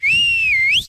guard, mob

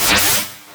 impulse

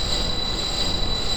worked, fabricnode; tile

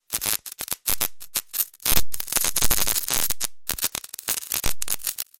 wire

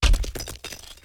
step, krampus

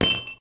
drop metal

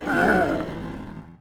ogre damage; mob

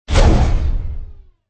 walk, robot; mob

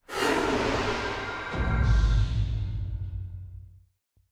portal alt

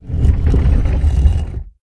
stun, stonegolem; mob

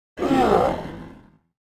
ogre attack

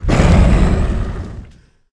stone golem minion; mob

